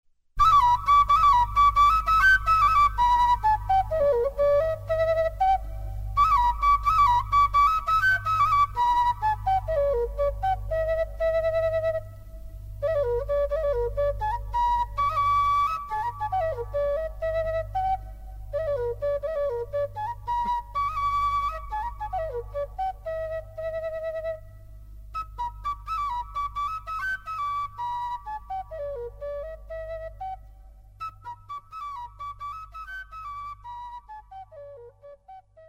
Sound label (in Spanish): quena